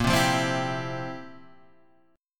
F Major 7th Suspended 2nd Suspended 4th, A sharp, guitar